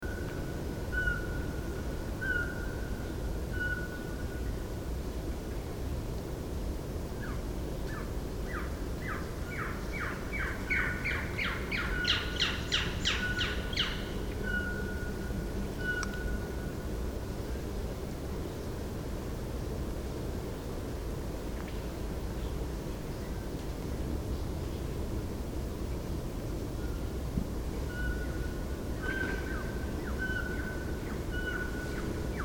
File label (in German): Sperlingskauz, Bayerischer Wald; Susanne Rieck